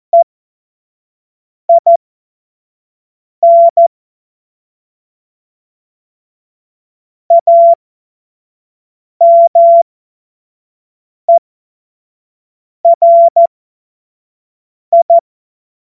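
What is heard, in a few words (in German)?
Witz, Morsekurs, cw morsen; Witze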